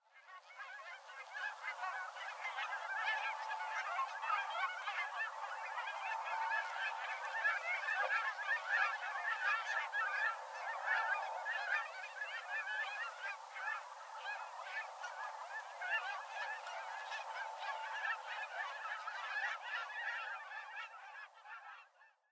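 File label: Cackling Goose; シジュウカラガン; 宮城県登米; 神山和夫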